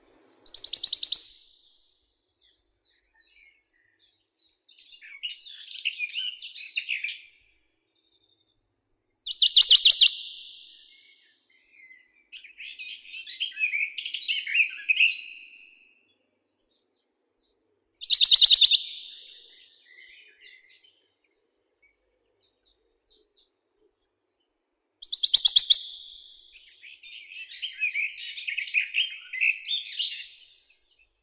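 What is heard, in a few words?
Bonelli's warbler, Phylloscopus bonelli; penochka belobruhaya; zvuki prirodi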